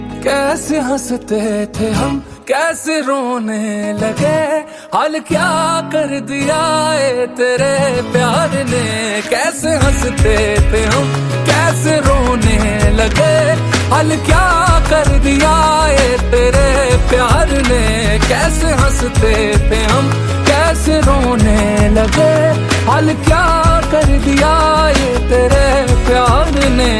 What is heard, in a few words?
Punjabi ringtone